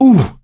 hurt minor; survivor

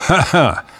laughter; namvet; Left 4 Dead 2; left4dead2 dlc2

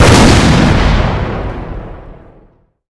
grenade launcher explode; weapons, grenadefire

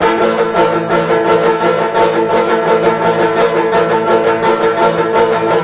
banjo; Left 4 Dead 2; zombat; danger

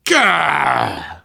negative noise, survivor voice, biker

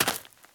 gravel, footsteps walk; survivor

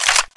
rifle slide back, rifle ak47; weapons

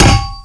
metal canister impact hard; physics

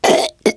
choke, survivor voice, biker

